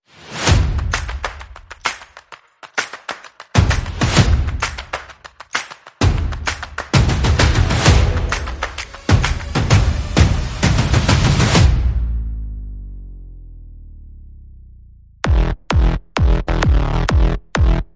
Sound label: No Lead Synth